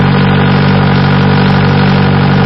Pickup; 暴力赛车

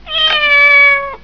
CAT, 猫; 特酷声音素材库

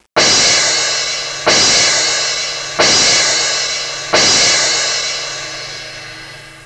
Joy, happymusic; 特酷声音素材库; 背景音乐

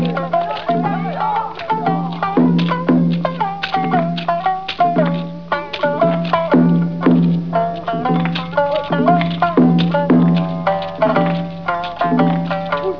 Joy, happymusic; 特酷声音素材库; 背景音乐

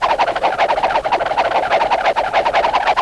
Fight; 特酷声音素材库

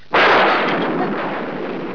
Thunder; 特酷声音素材库; 自然; 雷声

